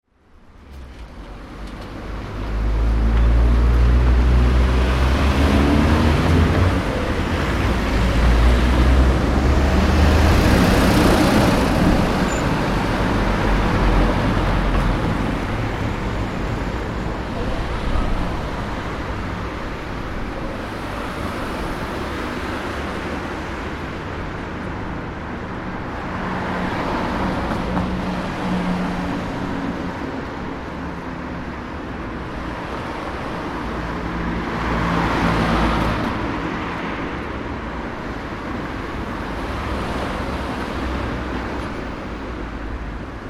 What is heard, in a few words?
Town (small) road ambience: traffic, occasional pedestrian crossing in background; Traffic And Car; Stuart Duffield